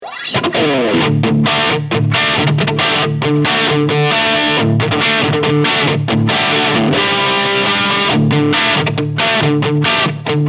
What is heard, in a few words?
Engl Powerball Distortion Heavy Crunch